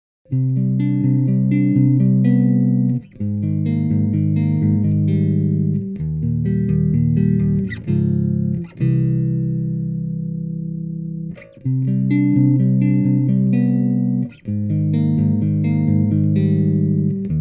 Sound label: Soft; 4 Stroke